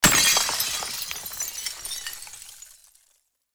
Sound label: Large window smash glass break; household